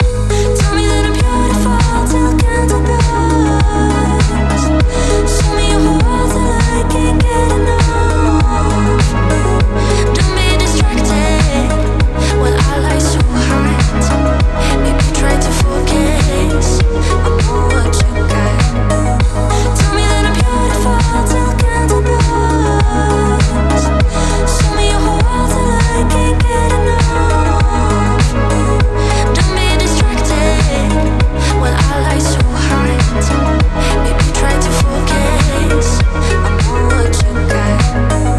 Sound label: bass vocal test